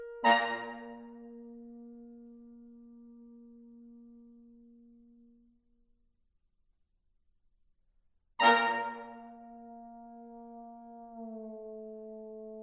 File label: Brass Quintet; Mvt. 4. Come Prima